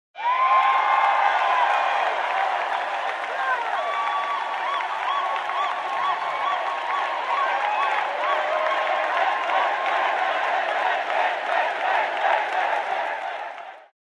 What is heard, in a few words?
Audience in a game cheering and whistling